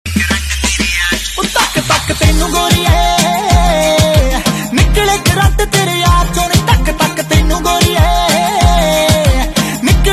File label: ringtone